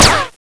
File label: cement, debris